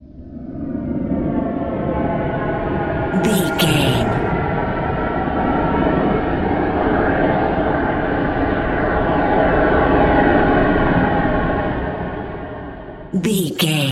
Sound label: dark portal wind effect